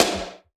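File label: snare drum; REMIX 5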